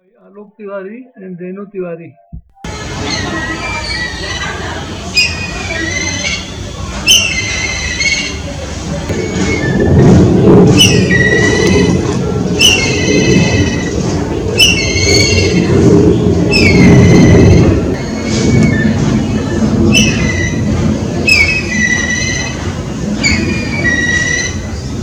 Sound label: Black kite call; alok